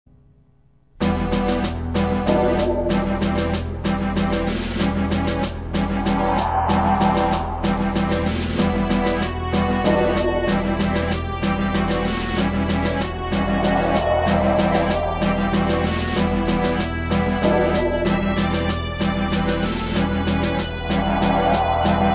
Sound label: menu, music